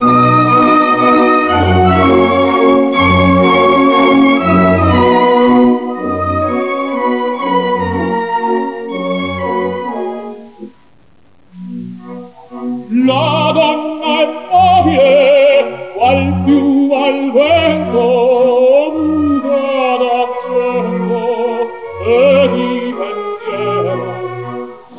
classic radio

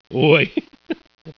hehe

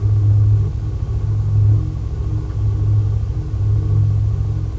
ambient machine

